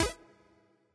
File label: collect; Aviamasters; bonus